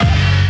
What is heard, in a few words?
alien army